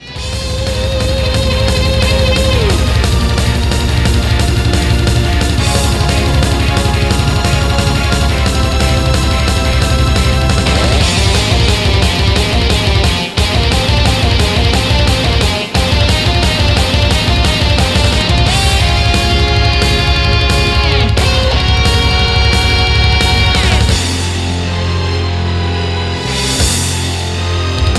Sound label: battle